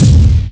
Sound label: Entrance Bomb; Toon Link's fight entrance (bomb explosion); Zelda